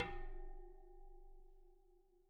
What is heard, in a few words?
Bicycle Spoke